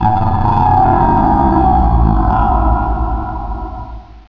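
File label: cavern ambience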